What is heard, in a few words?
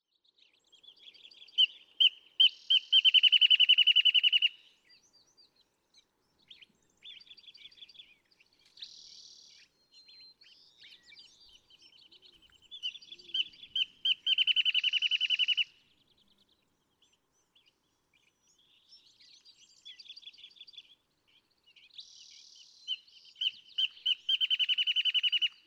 Wrentit